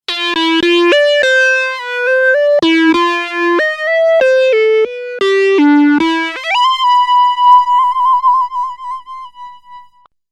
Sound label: ems01 vintage solo